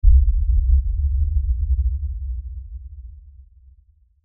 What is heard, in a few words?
LFE Tremor, Lfe Tremor Low Bass Shake Rumble; Rumbles; Blastwave